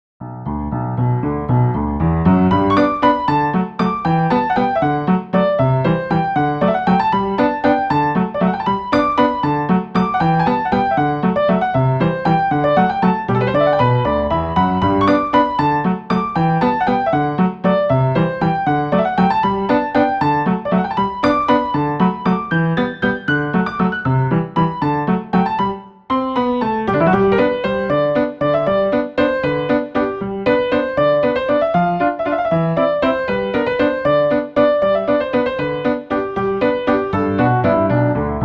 Ragtime

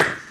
Sex Smack

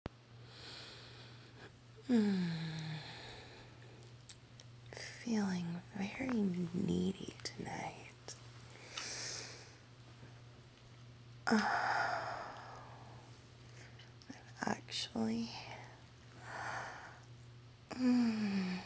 moans, wet noises; F4M; Tell me what you want

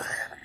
Suck

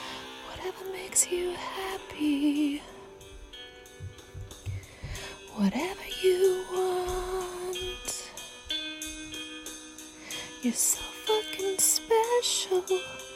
Singing Excerpt, Singing Excerpt

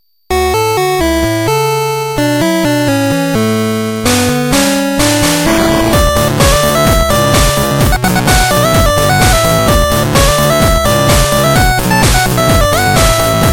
Chiptune Version

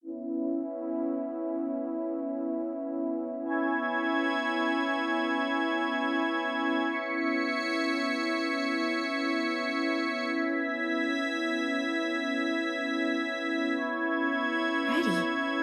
Ballad Remix

